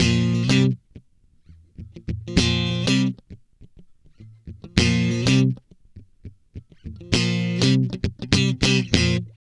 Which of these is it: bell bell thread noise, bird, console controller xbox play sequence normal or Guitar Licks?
Guitar Licks